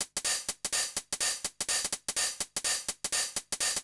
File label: HIHAT HOUSE 125, Drum; eJay